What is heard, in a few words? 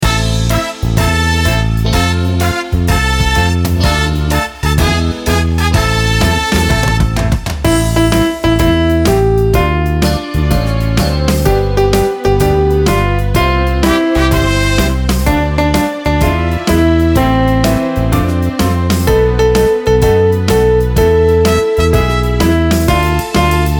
karaoke